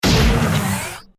bonus bomb; boomopera sfx

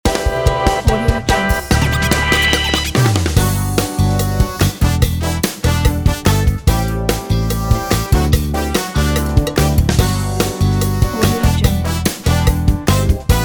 intro for show

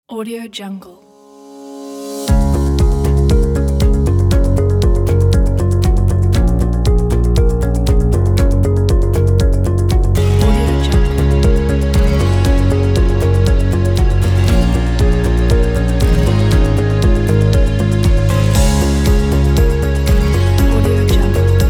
uplifting and inspiring corporate

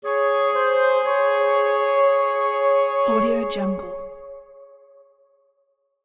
music woodwinds; prosoundfx

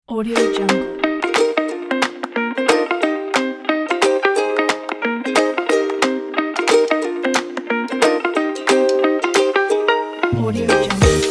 happiness ukulele upbeat music

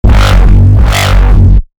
robo synths growly robo; sinematic source recordings; soundmorph

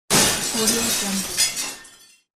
crash glass; tr-sound

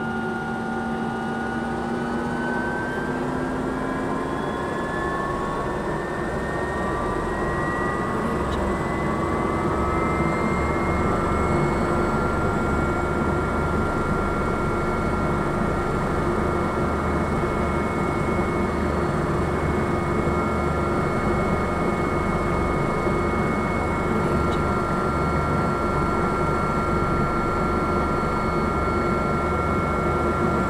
helicopter interior take off

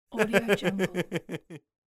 male giggle; medusamusiclab

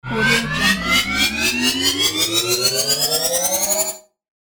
robot power up

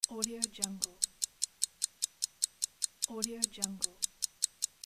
clock ticking

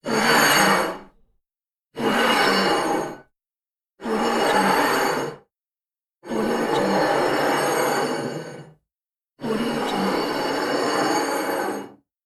plate slide

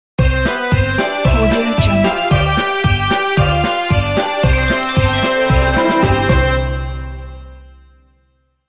merry christmas ident

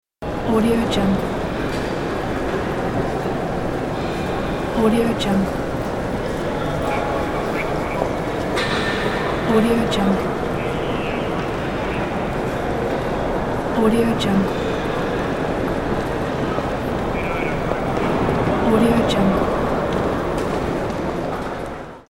crowded airport

